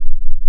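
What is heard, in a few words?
ring modulation sin sin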